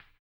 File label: HAMBONE; ILIO; Vocal Planet VOL-2; Blues & Soul